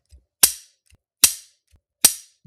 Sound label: claw clip